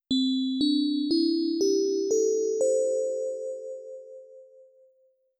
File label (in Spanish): Do Pentatonica Mayor